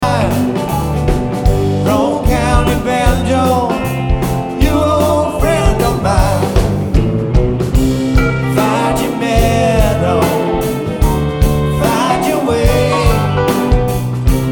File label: Banjo